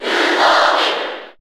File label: Cheer Japanese; SSBU